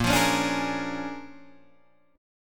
A sharp 7th Sharp 9th, Guitar, Open D

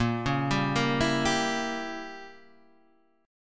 Bb Diminished 7th Bb Guitar Standard; arpeggiated; JGuitar

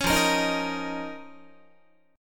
F 7th Suspended 4th Sharp 5th F down Guitar

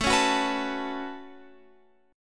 A 6th Add 9th, Guitar, Open F; A6add9 strummed

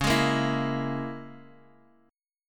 Db Minor 6th Guitar Half Step Down

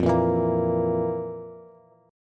F Major 7th Suspended 4th F, Bass; FM7sus4 strummed; JGuitar

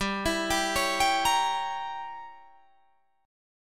G Diminished 7th, Guitar, Drop D

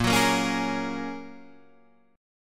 Bb Minor 7th Sharp 5th

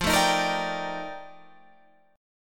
F 13th F, chord

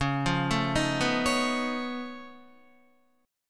Db Augmented 9th Db down Guitar; arpeggiated; JGuitar

